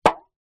foley drink can cola impact liquid inside

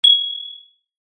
impacts metal ping high pitched small

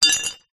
animals horseshoe metal down on hard ground

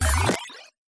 science fiction computer; Audio Mangler

